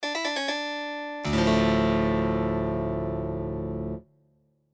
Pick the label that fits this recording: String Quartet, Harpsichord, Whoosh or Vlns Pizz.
Harpsichord